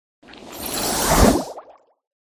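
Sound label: centipede buff; skolopendra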